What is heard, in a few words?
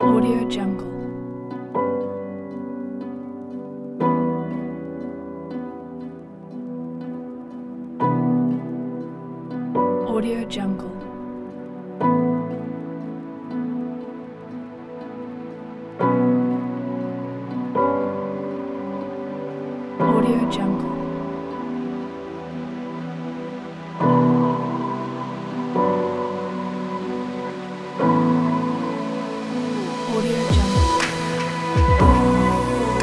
noire chillstep